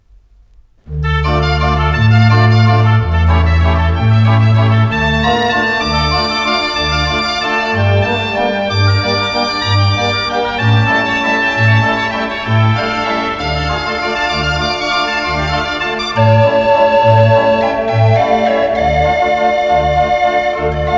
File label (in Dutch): draaiorgel; de brandweer den haag